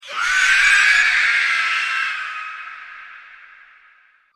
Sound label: Necro scream; criminality